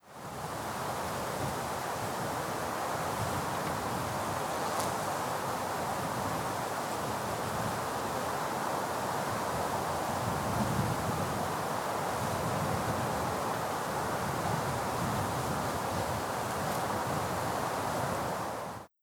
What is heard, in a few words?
Atacama Wind